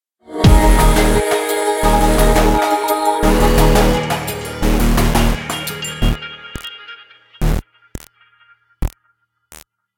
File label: Fight Low Combo; Remember Me